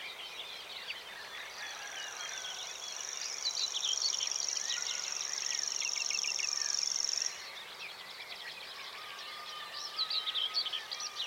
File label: Common Grasshopper Warbler, Feldschwirl